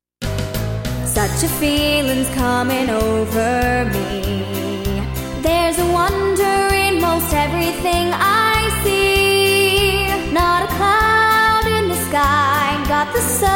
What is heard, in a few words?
MELODY